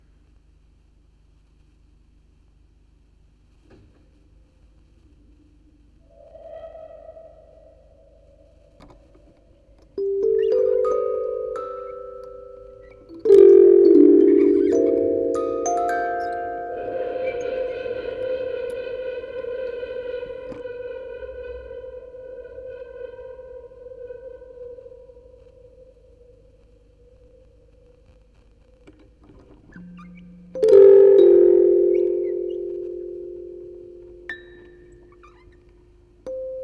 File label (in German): Aufnahme Spieluhren Quartett 4 Kanal stereo